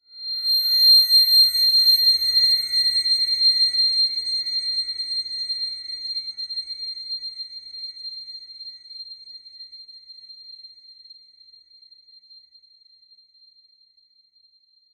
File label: horror whine shrill high pitched; zapsplat